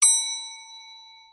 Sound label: ring, elevator